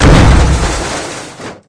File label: Vehicles Generic